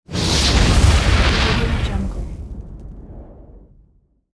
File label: fire explosion